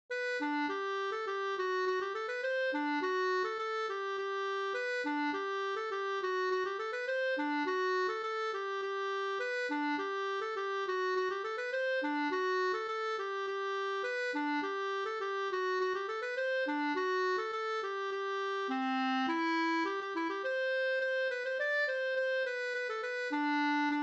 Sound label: polka; fr Yxlo e Albert Sundstrom Sd